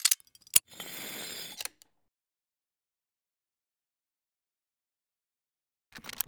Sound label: Criptex Opens; yesterday origins gamerip 2016 switch ps4 pc ios macos